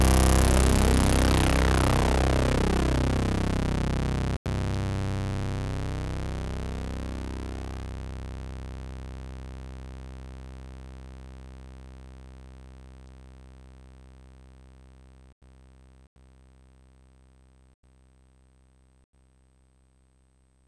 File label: OPL2